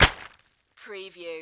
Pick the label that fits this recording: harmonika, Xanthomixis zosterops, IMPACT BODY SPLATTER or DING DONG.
IMPACT BODY SPLATTER